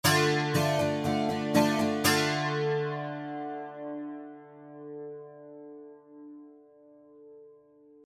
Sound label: guitar chorus dual